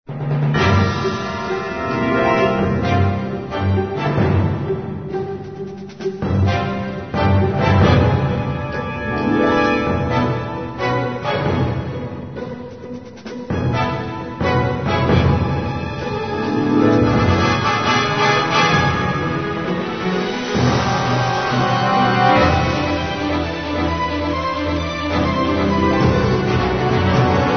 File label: Battle - Boss Battle; Moonchild; rpg maker